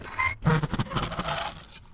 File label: Monster Noise; BB Retail Therapy Sample Pack